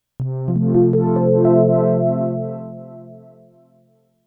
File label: beautiful chord